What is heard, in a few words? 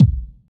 Boom Bass Drum One Shot B Key, Royality free steel kick drum sample tuned to the B note.; kick drum samples